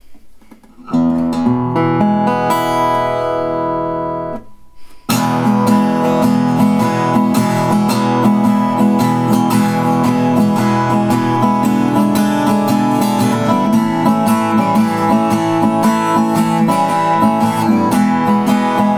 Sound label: Sitka Mango Blues in E